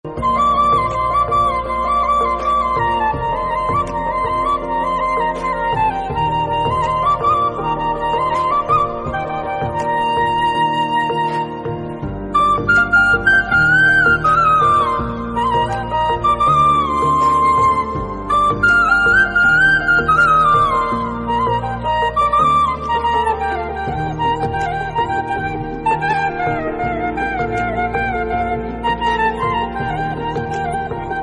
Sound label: Instrumental Music Ringtone